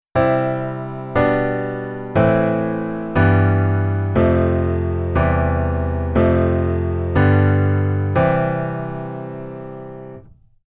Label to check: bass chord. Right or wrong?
Right